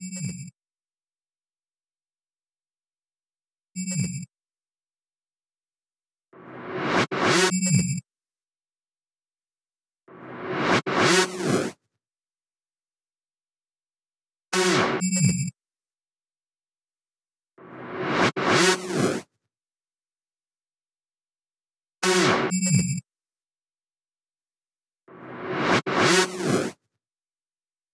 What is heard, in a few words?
FX f#, Drum Loop 128BPM; VEC5 Drumloops; VEC5 Multi Loops 128BPM; Loop Elements